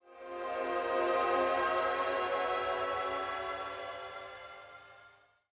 Health Flourish; Insanely Twisted Shadow Planet

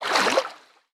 Floor Sink; boomerang fu

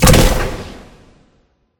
Sci-Fi Weapons sci-fi weapon rifle boomer; SFX

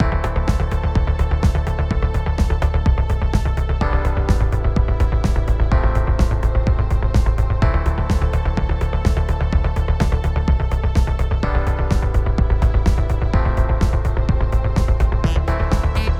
fisher battle

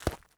FOOTSTEP Trainers Gravel Compact Run; Don't Be Afraid The First Toy

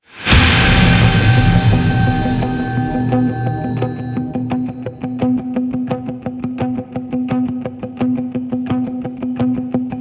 86bpm Layer Loop

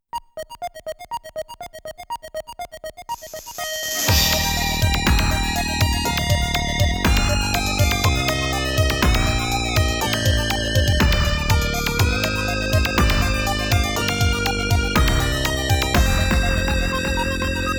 Ending - Heartwarming; Aero Fighters 2; Sonic Wings 2; arcade

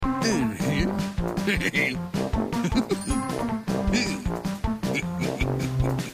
Star Loop; bad piggies; android; mobile; windows

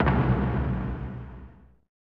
footsteps; Shin Chou Kouki; SNES

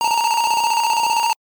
Hurry-Up Sound; hopping mappy

